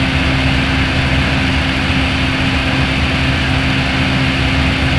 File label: CFM idle, CFM56, wing aft; A32X